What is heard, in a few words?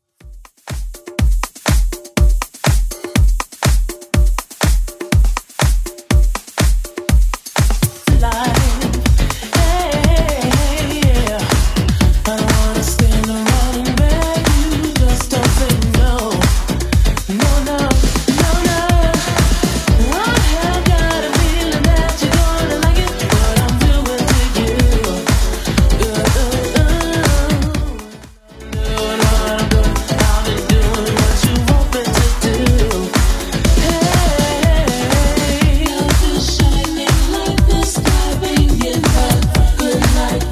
80s Club Edit 122 bpm